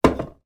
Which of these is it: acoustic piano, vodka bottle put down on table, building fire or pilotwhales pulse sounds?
vodka bottle put down on table